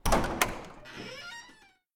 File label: Open A Door Room; LOOPERS